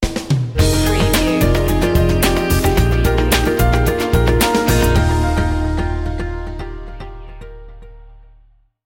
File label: bright and corporate stinger